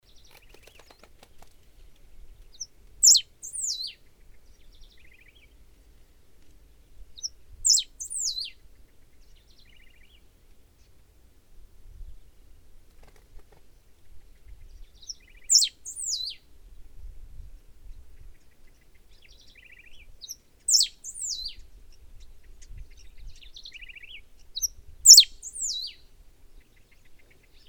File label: Serra finch